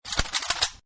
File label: AK47 Load; Assault Rifles; Guns; Partners In Rhyme